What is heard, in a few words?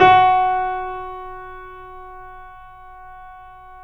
F#, The Piano; InVision Interactive - The Piano